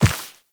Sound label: Walk Dirt, Footsteps; Operator